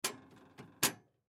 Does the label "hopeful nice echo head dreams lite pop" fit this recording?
No